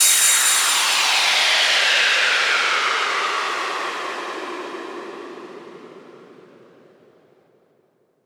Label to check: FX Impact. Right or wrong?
Right